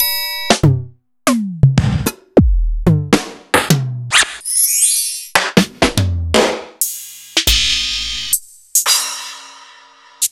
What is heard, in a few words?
EMU Proteus-2000; m8